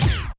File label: SCRUNCH, Drum Machines, Effect; Yamaha DTXpress